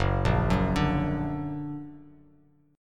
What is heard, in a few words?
Gb Augmented, Bass Standard; 2,1,4,7; Gb+ arpeggiated